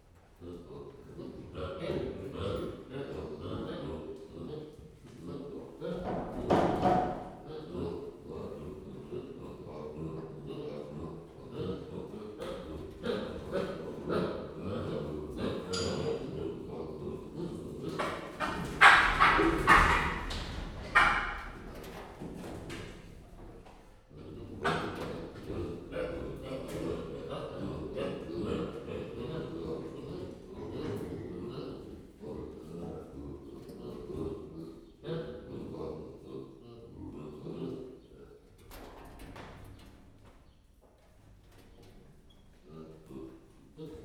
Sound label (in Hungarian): valami azert megiscsak, galleros pavian; gyori zoo 2014